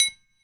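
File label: pipe drum; ILIO - Ethno Techno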